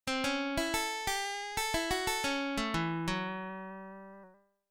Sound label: Blues, Blues lick